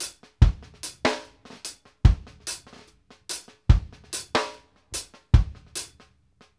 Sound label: KICK ON 2 OFF, REGGAE 72; Sampleheads; New York City Drumworks VOL-1